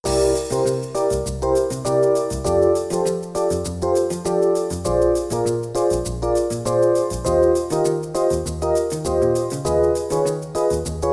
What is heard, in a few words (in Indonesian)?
Nada Dering Nokia Jadul Oceanic